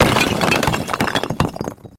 rock falldown

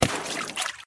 hit pumpkin

